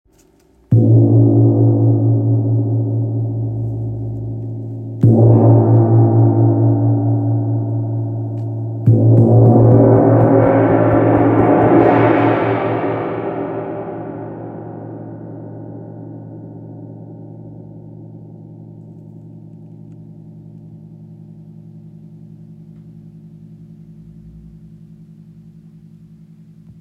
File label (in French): Wind gong 60cm la